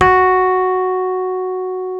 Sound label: ROADZ F#3, Suitcase E.P; E-MU Producer Series Vol. 2 – More Studio Essentials; Keyboards